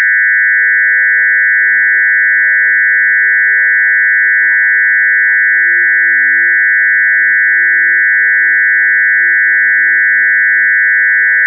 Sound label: Noisy Signal